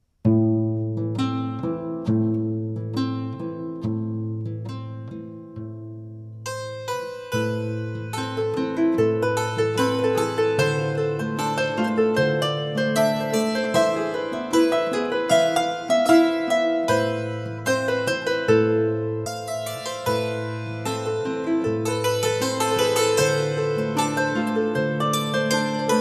Habanera Gris

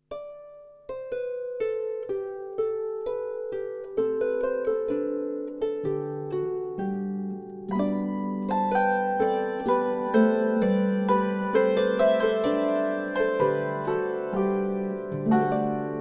Deck the hall; ADVENT

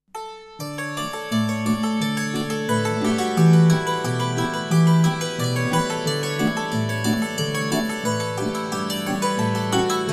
Devils dream

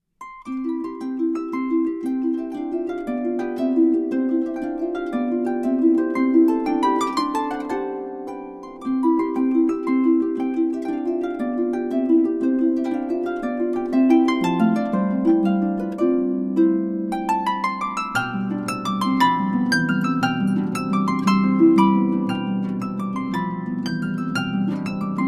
C-Moll-Sonate Rondo Allegro

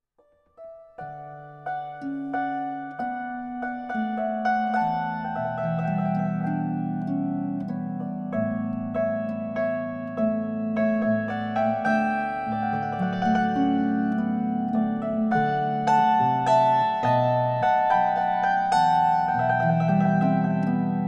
Scherzino Mexicano

